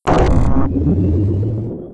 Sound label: nuclear start